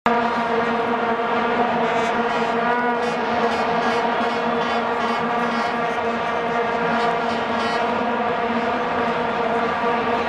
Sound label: Vuvuzela sound in match; صدای شیپور فوتبالی